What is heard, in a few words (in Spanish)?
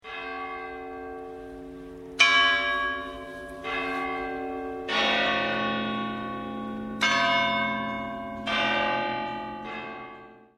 campanas